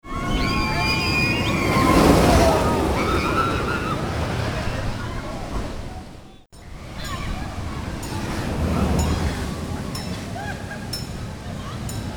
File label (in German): Atmo; EuroMir